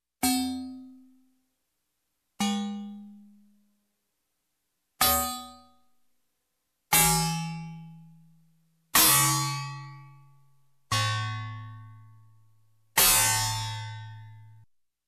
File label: Robotic Metallic Bells seperated